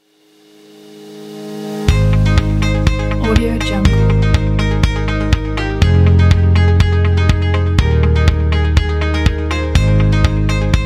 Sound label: short positive